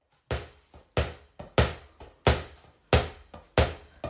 Ball spielen

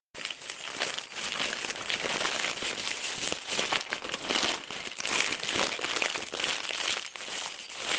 Papier

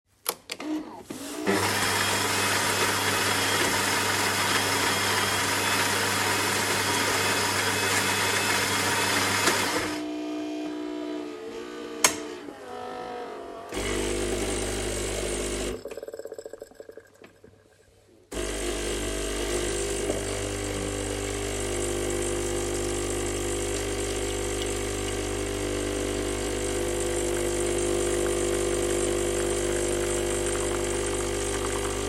Kaffeevollautomat

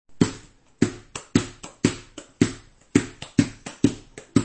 Ball springt

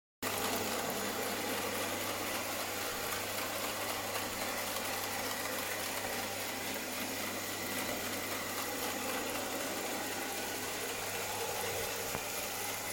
Saugroboter

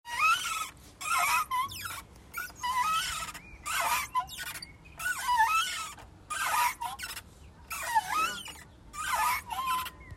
Quietschende Schaukel

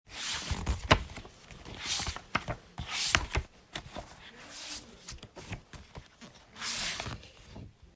Buch durchblaettern; Buch umblättern

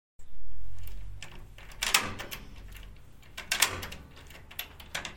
Tuerschloss

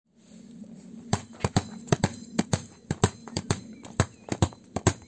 Ball prellen

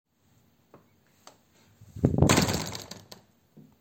Tuer faellt ins Schloss

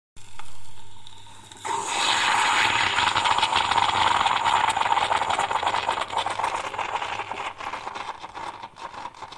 Espresso

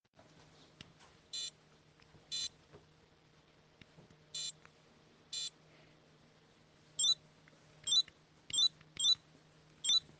Tamagotschi

